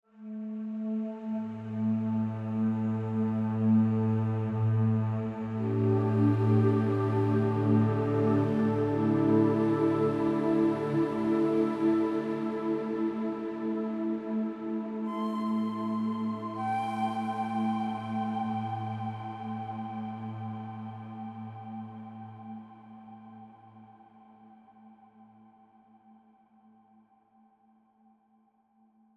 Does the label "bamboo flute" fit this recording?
Yes